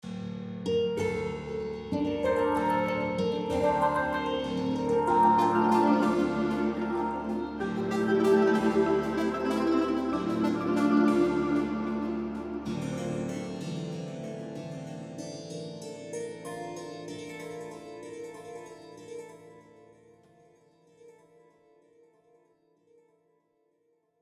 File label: map start background; Valorant; cstrike